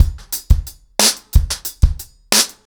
90BPM, acoustic drums, drumloops

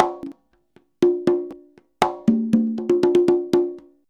CONGA 119

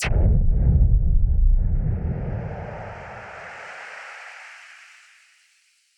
Noise Bomb Boom Filter; musicradar; bomb factory

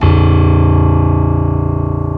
M1 piano

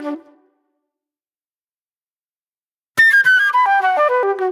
Agro Fusion Flute; Sonic Foundry (Sony Creative Software); Manhattan Flutes; Garage Funk

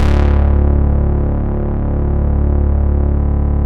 EMU MODULAR, modular syn; Sonic Reality; Vintage Timetraveler

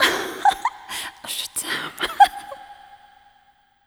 laugh

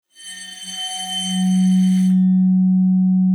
arco F#3, vibes; Xsample VOL-4

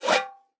oxaca, percussion; ILIO

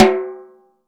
sonor snare

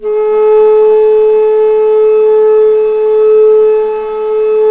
BASS, bass flute; USB Soundscan vol.23

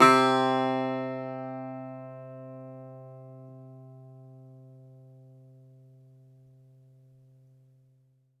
Greek Bazouki, plucked strings

